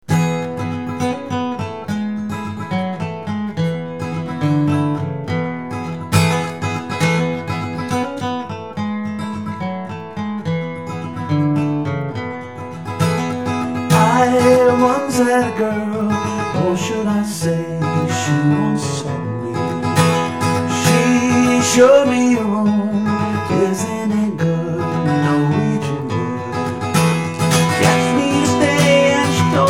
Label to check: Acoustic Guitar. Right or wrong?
Right